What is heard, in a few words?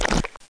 He Ha Leave Goop; Education Publishing Concepts; PARSONS